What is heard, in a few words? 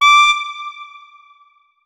notes, saxophone; Hideout Furniture